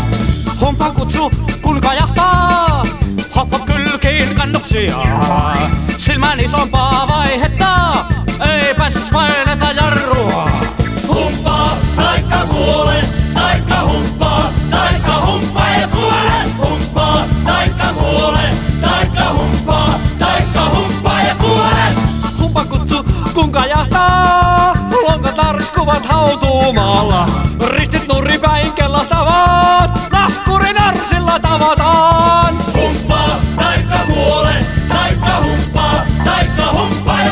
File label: humppa